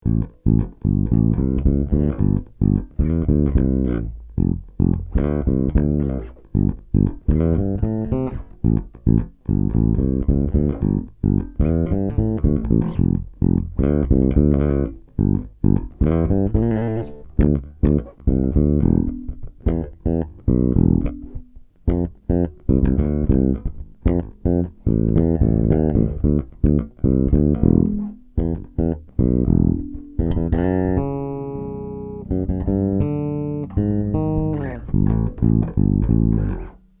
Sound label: Fodera C Passif Tone Mi, basses; fodera5